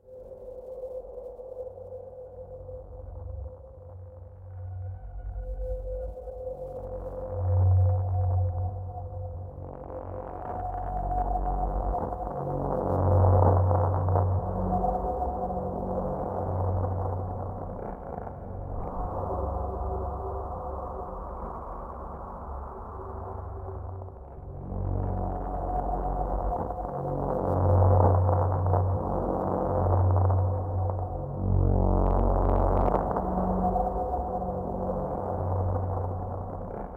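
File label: Train Ambience; SpyHunter Nowhere to Run; Windows, Xbox, Xbox 360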